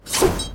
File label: generic Attack; Battle Day Brawl; ThrillGames